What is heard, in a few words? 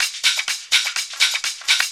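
drum loop 125, hh+filterloops; Vocal House; PS-28 Vocal House Samples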